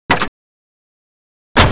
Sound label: car door; valve